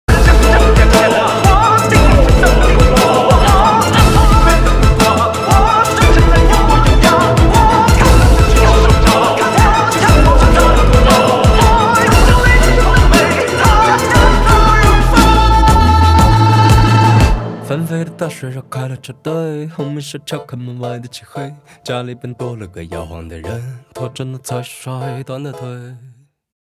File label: balalaika; 巴拉莱卡 门尼